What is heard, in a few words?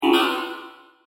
Multimedia Notify, Technology, Multimedia, Notification, Element; Alert Sound Effects; BlastWave